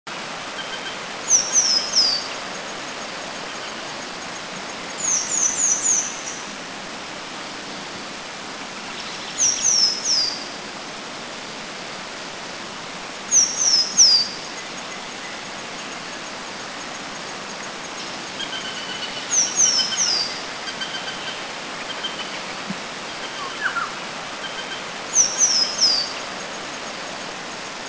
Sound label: White-fronted Tit, Philippines